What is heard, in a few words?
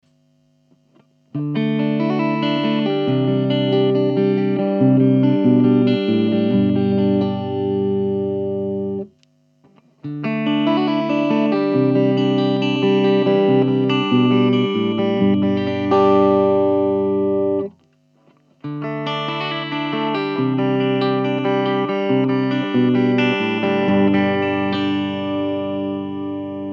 clean; Fernandes; Clean test